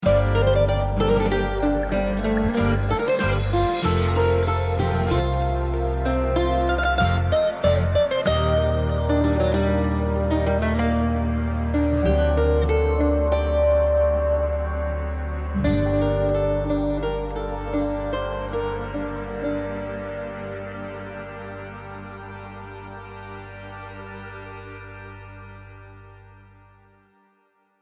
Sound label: map start background